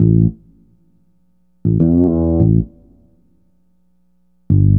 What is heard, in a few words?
bass, Phonky loops